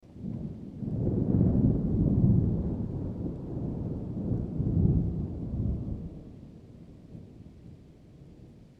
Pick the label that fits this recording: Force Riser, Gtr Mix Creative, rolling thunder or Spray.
rolling thunder